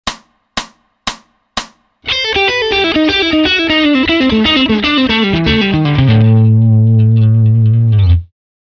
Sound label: A Dorian Line fast